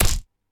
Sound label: imp bird arrow